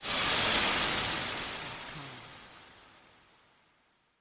Magic Blast; SoundsCrate

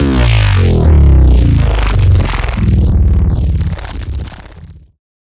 Bass Drop; SoundsCrate

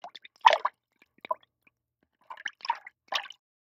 water splash; soundscrate

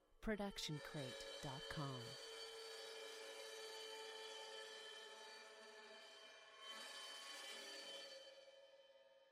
crash cymbal 16 inch scrape; soundscrate

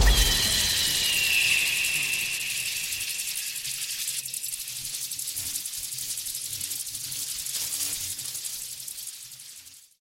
Anime Lightning Attack

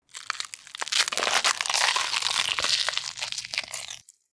body gore

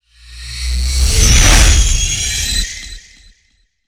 fly by; soundscrate

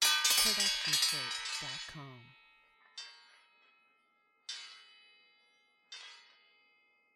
Metal; SoundsCrate

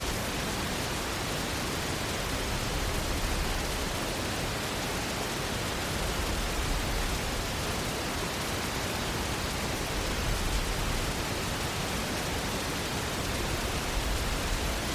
waterfall and rumble; soundscrate

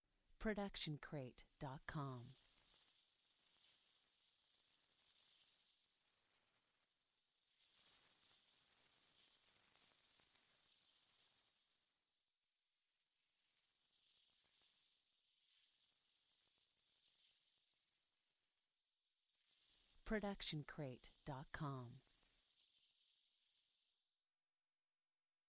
Magic Portal; SoundsCrate

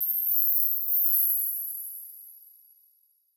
fairy dust; soundscrate

